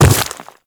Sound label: Infested Footsteps Quadruped Medium Land Dirt; Warframe